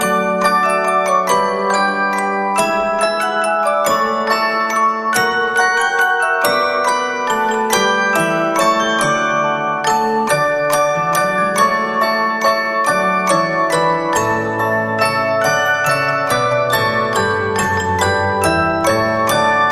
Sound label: We Wish You A Merry Christmas Music Box